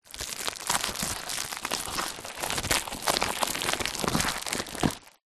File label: foley tape on cardboard box peel movement; zapsplat